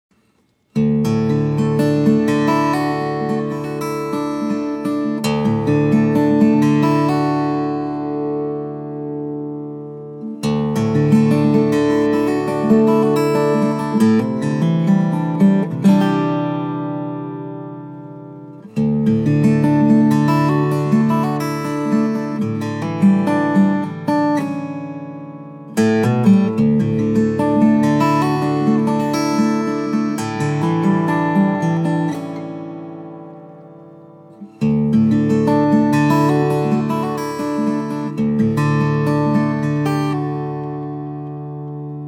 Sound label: Guitar